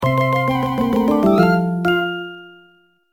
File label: Fanfare; Ranger